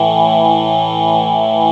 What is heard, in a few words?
FORMACHOR, ZOA-CHOR; Best Service Dream Experience